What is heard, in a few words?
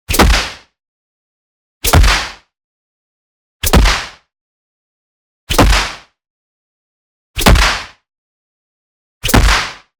PUNCH Fast Strike; boom library; Anime Essentials